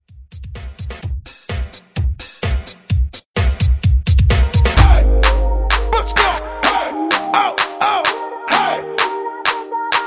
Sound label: Throwback Redrum; Remixfreakz